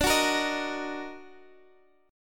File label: D sharp Minor Major 7th, guitar chord